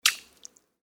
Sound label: Footsteps Puddle One